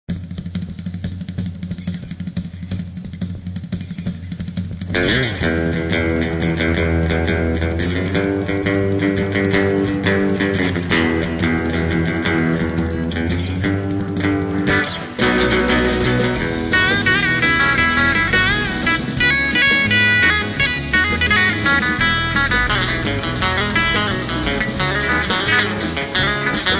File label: Driving Guitar